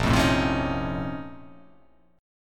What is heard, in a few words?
A sharp Minor Major 11th